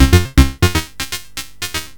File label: HP no res no clip